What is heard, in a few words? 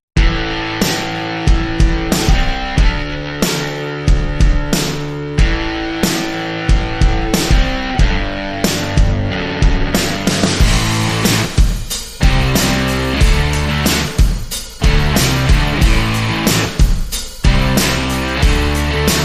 Indie Culture, No Lead Guitar